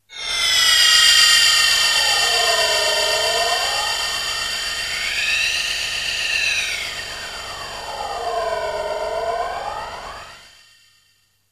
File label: magic